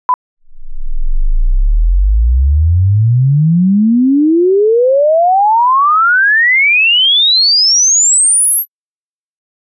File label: Test signal (44 kHz 24-bit) Impulse, Phase response